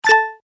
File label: UI Bet; football; Brazil